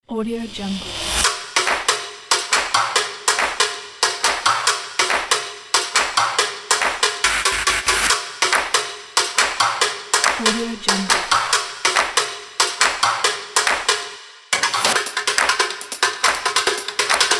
fast percussion drums

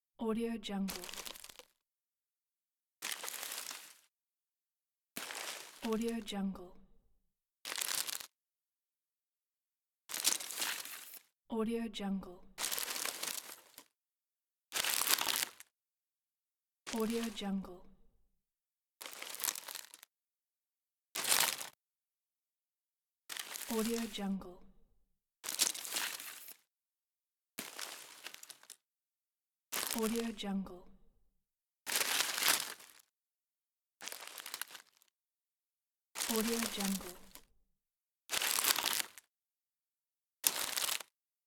walk gingerly with boots in the forest

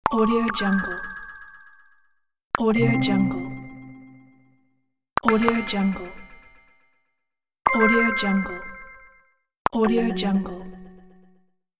magical buttons

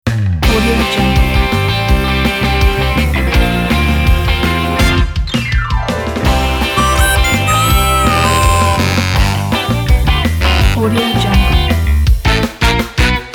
children rocky party ident

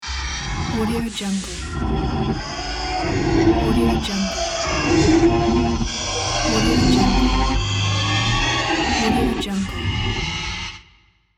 monster

